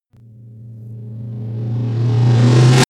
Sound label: reverse hit energized rumbling